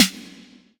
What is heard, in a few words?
airy sizzle steel snare drum sample b key